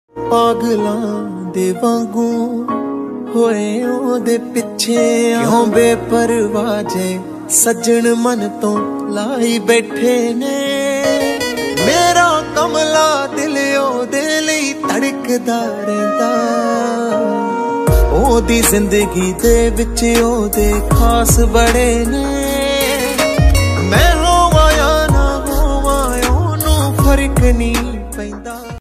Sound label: Desi Punjabi Ringtone